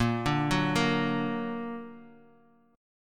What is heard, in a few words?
A sharp Augmented